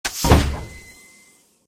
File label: Hangar Door Open; Lethal Company